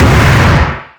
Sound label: hagexp, weapons